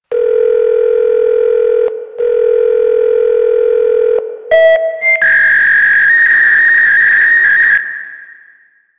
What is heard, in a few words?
Office Fax Machine Misc; Warframe; Ambience; NineteenNinetyNine